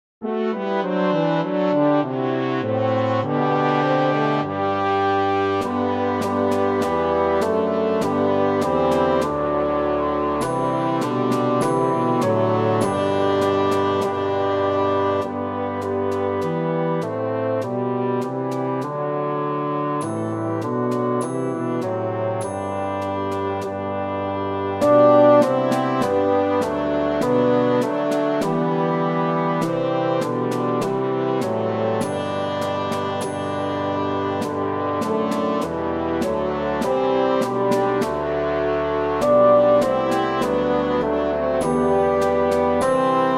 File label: Mini Bassoon Track with Melody; Good King Wenceslas